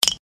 Small sized thin wood panel hit

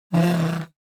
Light furniture sliding on floor moving

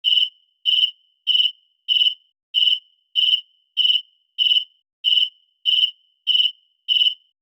Crickets chirping; animal

